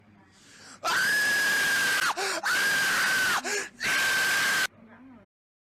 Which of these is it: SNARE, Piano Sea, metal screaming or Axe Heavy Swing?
metal screaming